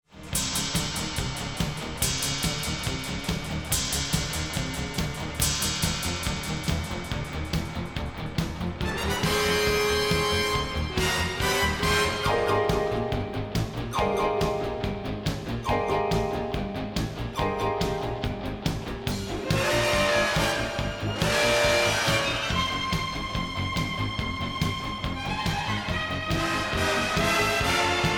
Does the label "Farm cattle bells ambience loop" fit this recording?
No